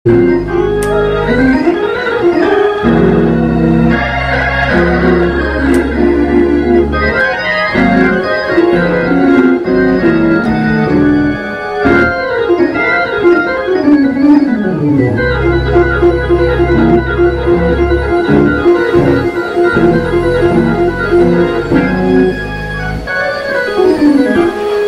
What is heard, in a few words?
organ shout